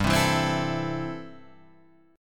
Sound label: A-Minor 7th G